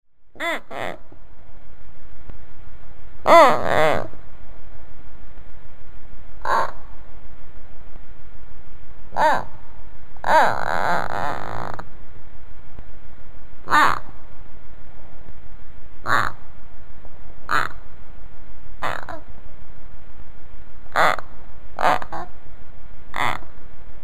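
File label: sounds of hamsters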